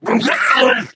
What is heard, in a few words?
ballbot yikes